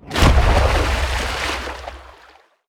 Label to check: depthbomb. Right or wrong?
Right